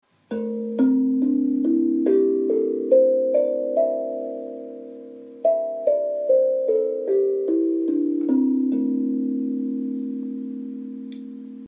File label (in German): Zenko Penta C Schlägel